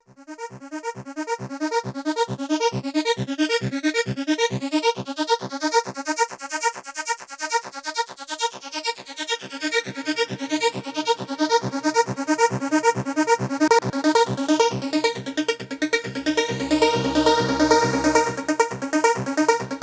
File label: Plucky Arping Cut Delay Verby